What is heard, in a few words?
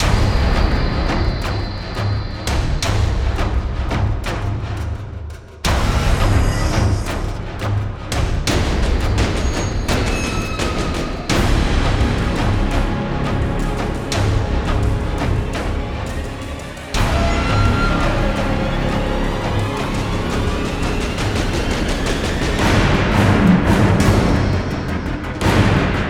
Base Loop low